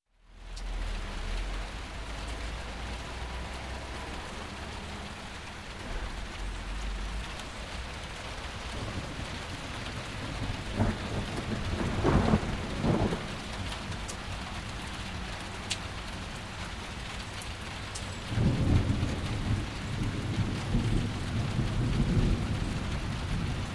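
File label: Rain, Thunder, In Building; Sound Ideas 2000 Series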